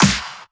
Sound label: Pre-Shifted Claps; Vengeance Samples